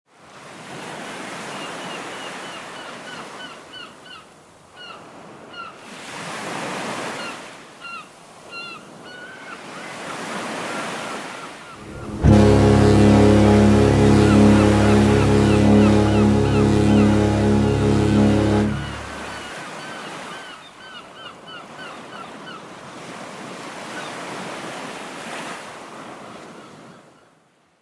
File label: Cruis Ship Waves